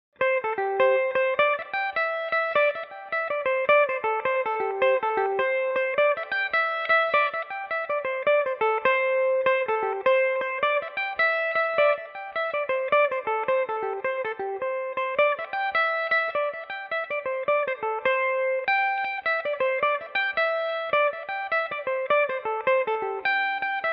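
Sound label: jig C major belmuse, irish mandolin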